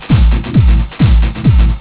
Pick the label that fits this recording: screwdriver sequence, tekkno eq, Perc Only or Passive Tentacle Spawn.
tekkno eq